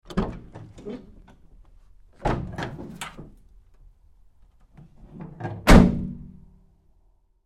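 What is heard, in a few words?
Truck GMC Savana Door 2nd Side Open Close Medium; Trucks; 2010 GMC Savana; Big Room Sound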